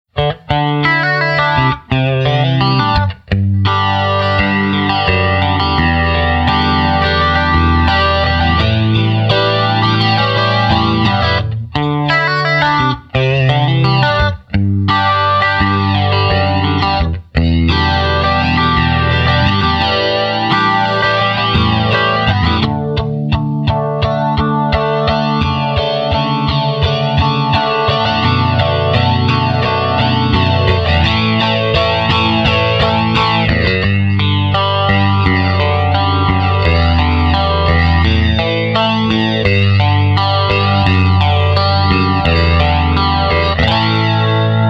Analog Chorus Smooth

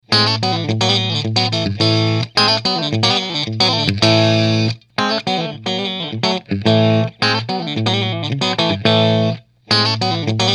Loaded Signal Fingers

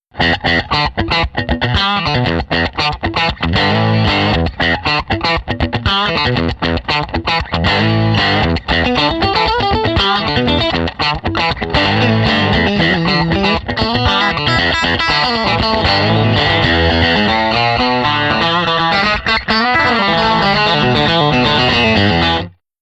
Sparkle Drive Funky Drive

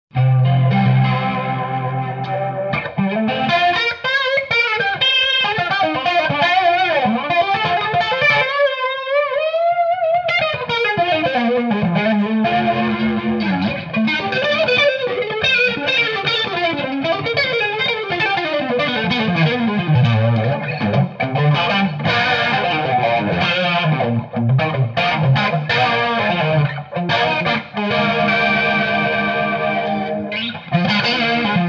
Analog Chorus Solo

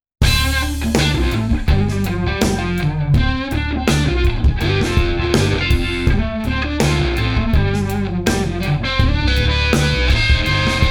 Fast Vibe; Fillmore

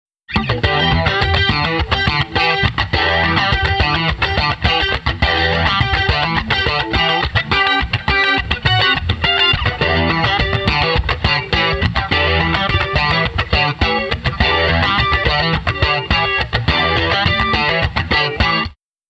Analog Chorus Funky

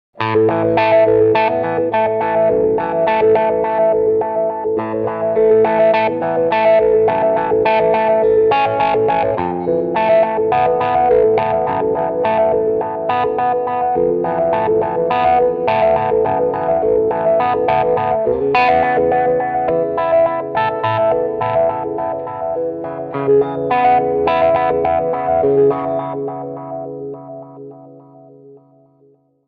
Step Wah CHORDS

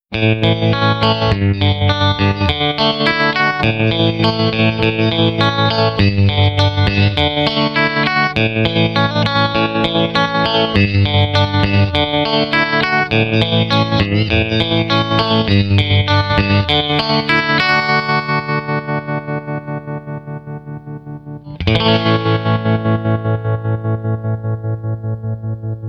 Tremolo Modern

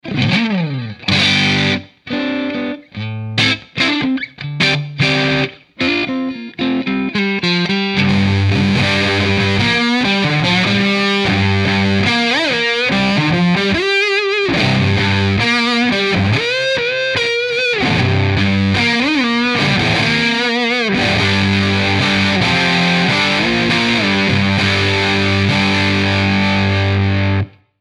Fuzz Face JTM50

